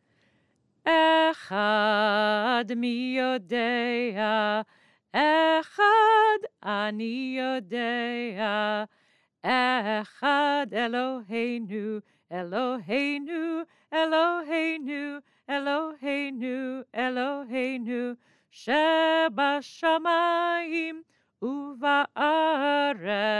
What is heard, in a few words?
Echad Mi Yodea verses 1 2 Longer tune